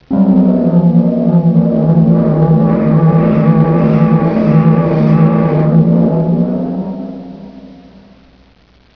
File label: Hum; WARLOCKSNDS